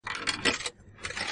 Inserting Coin Into Machine

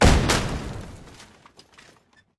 robot explode

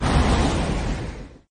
train pass no horn; CrossyRoad

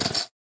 robot hop short

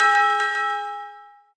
train alarm; CrossyRoad

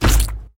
car squish; CrossyRoad

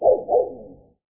dog space bark; spacedog

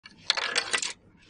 Inserting Coin Into Machine

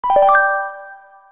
Earn Points

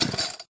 robot hop short

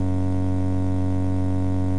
car; CrossyRoad

